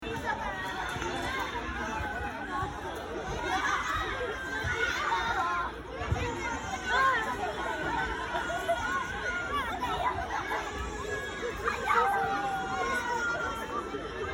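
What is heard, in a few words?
children at the playground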